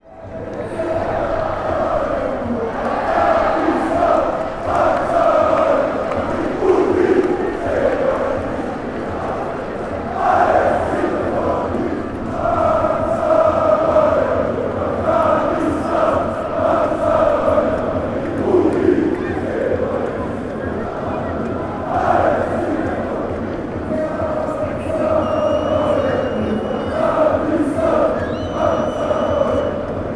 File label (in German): fangesange, fanchants; rapid wien